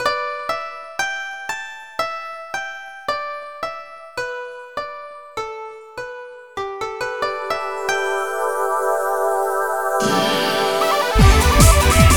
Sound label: nuba song